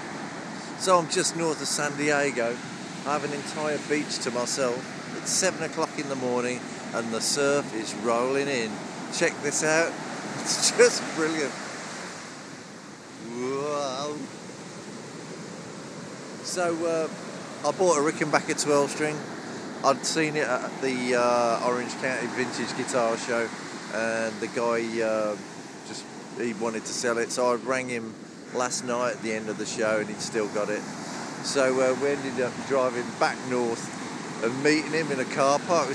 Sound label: Rickenbacker california surf